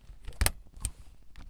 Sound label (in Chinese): （按键）key